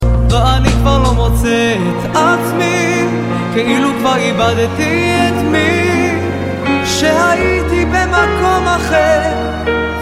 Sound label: Mizrahit